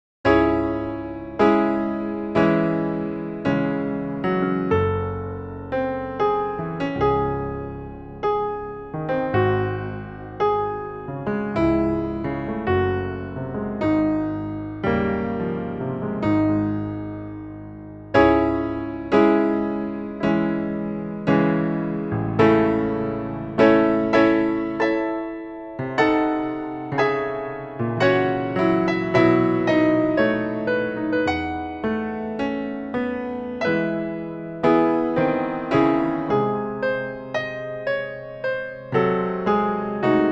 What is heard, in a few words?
symphonic Etude, piano